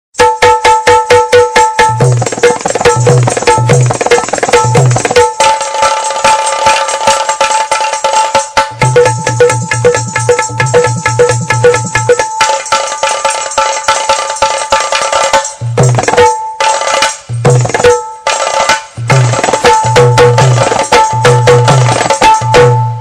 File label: Indian Instrumental